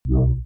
join; tunngle

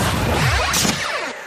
zipper; sticky fingas

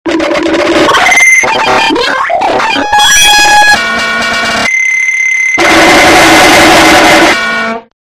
goofy ahh sound loud

